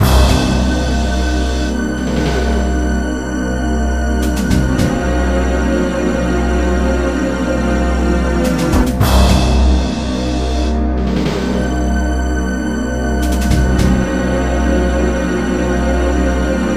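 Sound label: background music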